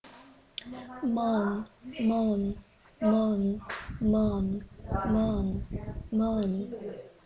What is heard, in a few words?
moan